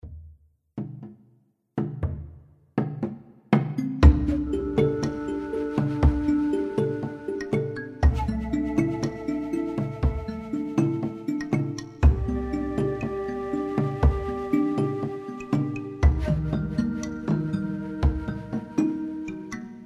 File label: bg music; Stampede Call of the Pride; Eyecon